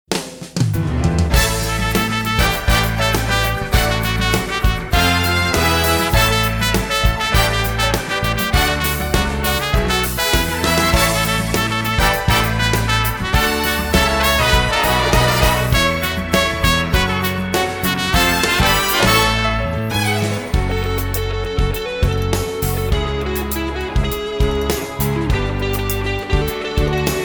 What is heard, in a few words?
exit music